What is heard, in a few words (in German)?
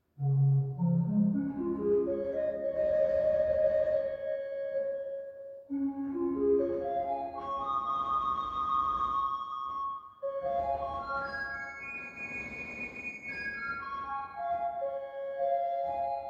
Rohrfloete 4, orgelrundgang